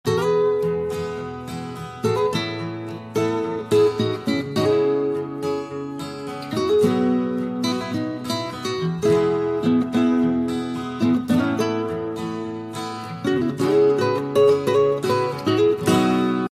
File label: Ringtone; Iphone